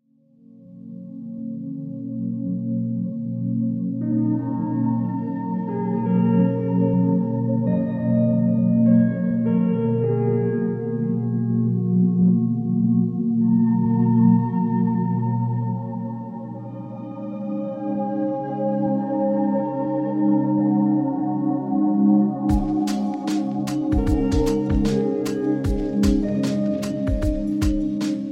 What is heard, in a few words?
oriental soundscapes